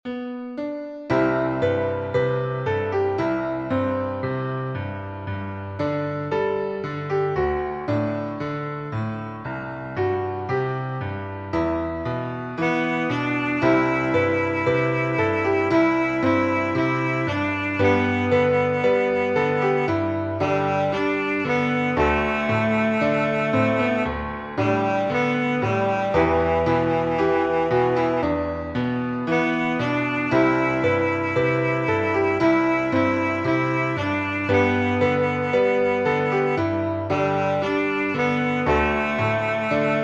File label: Alto Saxophone